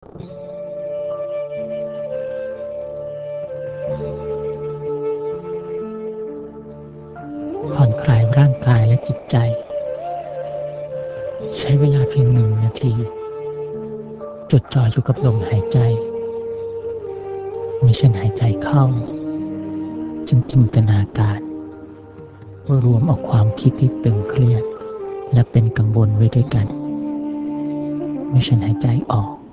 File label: Relaxing Breathing calm; meditation